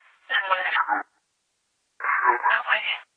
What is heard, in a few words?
vox blips; Ze Franks Pain Pack